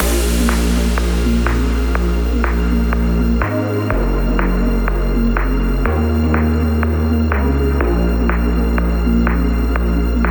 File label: Flutes Around; Evolution of Sounds; Deja Vu; serum